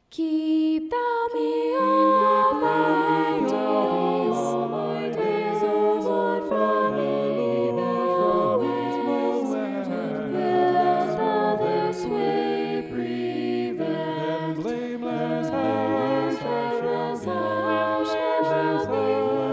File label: vocal poly; psalm 19